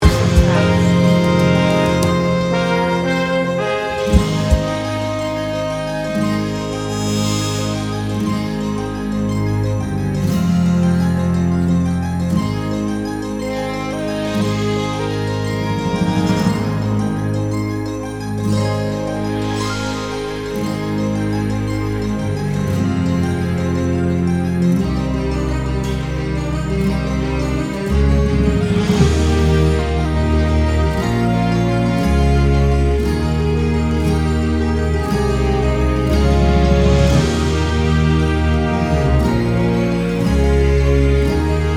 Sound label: Adult Male Backing